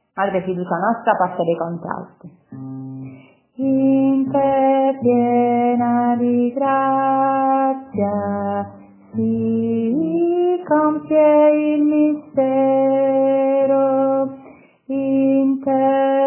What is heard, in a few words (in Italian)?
Madre Fiducia Nostra Contralti